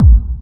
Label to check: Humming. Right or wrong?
Wrong